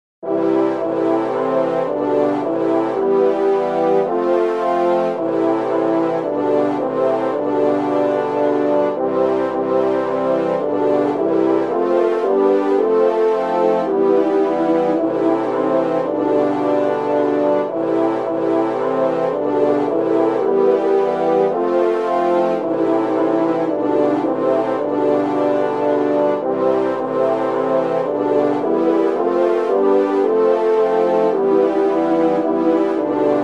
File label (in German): wer schon so viele jahre keyboard